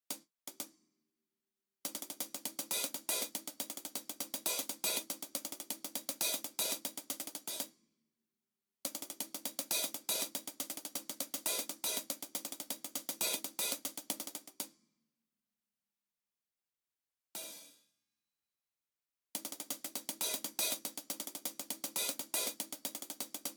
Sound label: hi hat